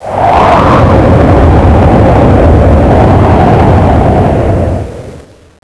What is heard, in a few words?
inhale